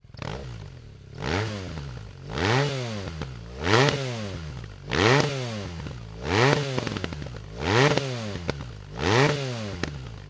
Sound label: hexa cone slip on exhaust bmw r1200 rr1200 rs; remus